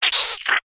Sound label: cartoon short wet squelch